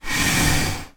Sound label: stool drag carpet